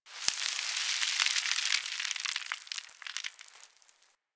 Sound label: Landscape Dust Scatter, Ambience; Warframe; Venus Open World; One Offs